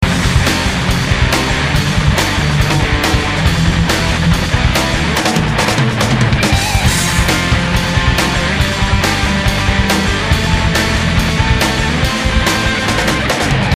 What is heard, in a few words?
loop